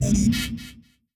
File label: resources capped, ui; Stellaris